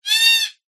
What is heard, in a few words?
animals bird cockatoo black squawk slight distance